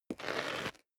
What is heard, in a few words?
step leather shoes concrete grit scuff